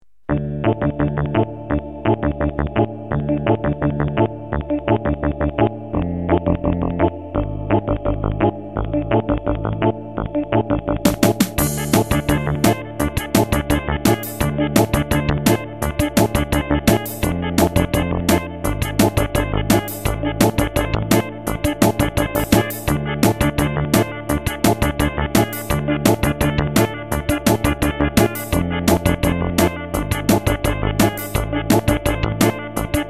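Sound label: danger, stock game music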